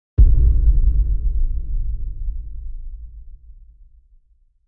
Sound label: Impact Low Metallic